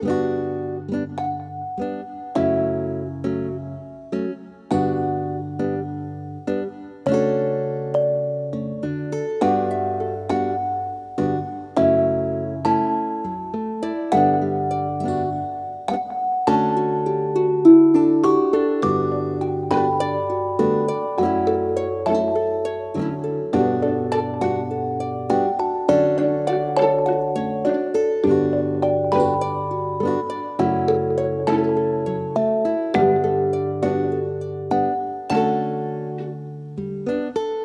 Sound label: Summer Love - No Percussion Loop; Beautiful Girl Fight School